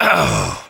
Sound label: pain; dukenukem; dmg